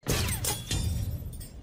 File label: headshot; Battlefield 1